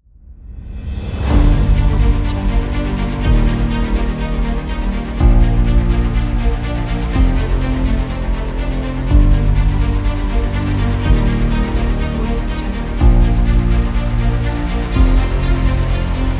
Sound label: epical